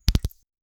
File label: star partials bust on, sparkle